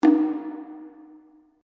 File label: DRUM TAIKO SHIMEB F DOUBLE HIT, Small Japanese Taiko drum; Miscellaneous Musical